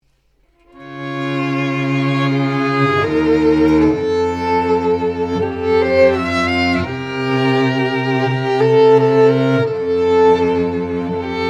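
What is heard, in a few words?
strings, London String Trio; classical musicians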